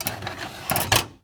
VHS Pull Out Of VCR; AdobeCC

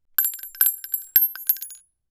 Weapon Bullet 3030 Rifle Shell Drop; AdobeCC

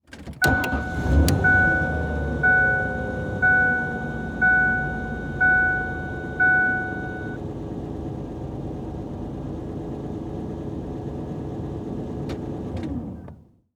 Truck Ford Ranger Start Idle Off Interior; transportation

